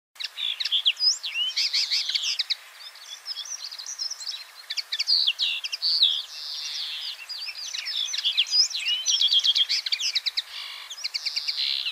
Common Linnet Singing

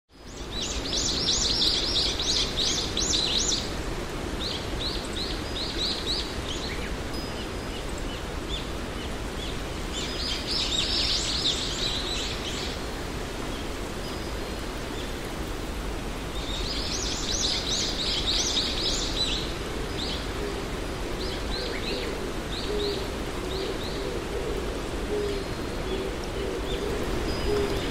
Garden Sound

